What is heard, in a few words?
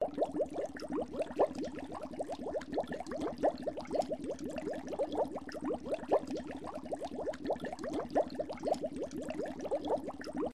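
bubbling of water, water bubbles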